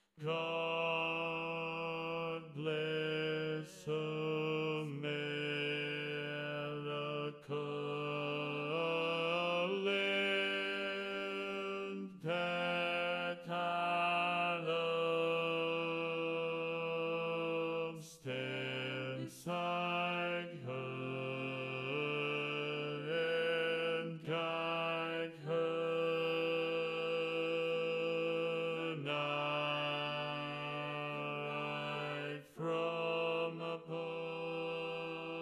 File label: baritone